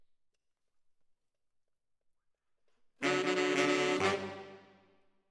ORTF SCHOEPS, piano

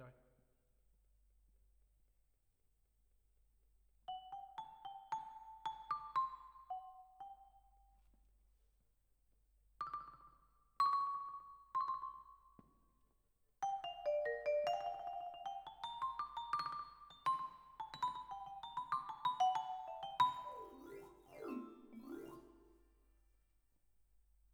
Vibra, Twin F

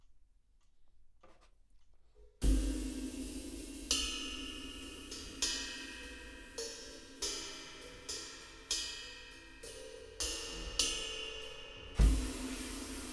Kit

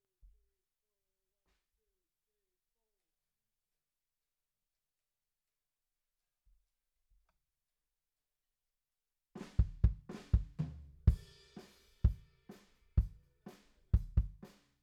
BD OUT

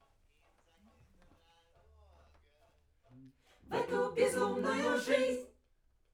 Backs SCHOEPS

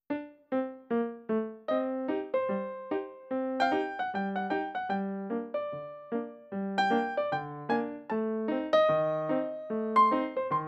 piano, Ragtime

